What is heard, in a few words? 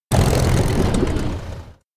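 electronic plane engine stop; anemoi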